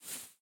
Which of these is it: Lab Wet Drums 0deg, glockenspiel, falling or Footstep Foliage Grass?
Footstep Foliage Grass